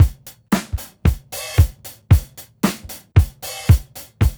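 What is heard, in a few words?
RETR BEAT1, 114 bpm; USB Soundscan vol.32; AKAI; Roots Disco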